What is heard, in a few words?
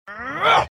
Mom Vox Grunt; The Binding of Isaac